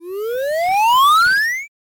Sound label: rising; video game; video game bundle; soundscrate